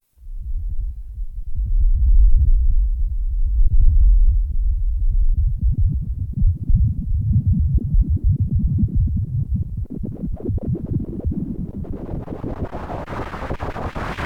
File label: Noise VCA